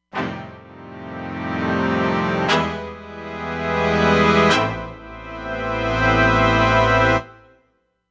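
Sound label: Pop Brass Sfz, Roland Fantom XR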